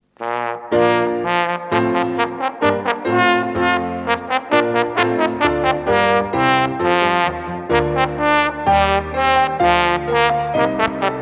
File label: Trombone Tune